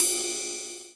XV Ride, Roland XV-5080

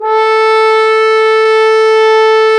FRH, Horns; Sound & Vision - Gigapack I CD 2 (Roland); Brass&Woods1

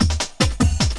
LOOP SD, Drum & Bass, 150 BPM; Ueberschall; Drum & Bass Resonance

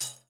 PANDEIRO

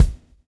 SWNG

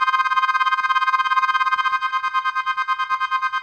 Kaossilator gated (130BPM); musicradar

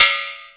TEK POLE, industrial; Roland - LA Composer vol. 1

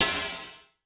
Snare Crash; Vermona Mono15 Drums; Stereo layers; blend with snare

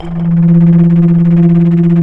FLT, Bass Flute, Bs Flutter; Roland LCDP04 Orchestral Winds; 90 s Sample CDs

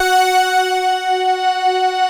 JUNO 106, PADS; Masterbits; Vintage Voltage

